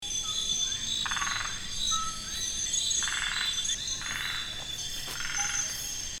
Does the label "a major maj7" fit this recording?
No